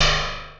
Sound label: failed; Szkola Koziolka